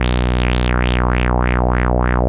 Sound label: WAH WAH B1; CMP - Analogon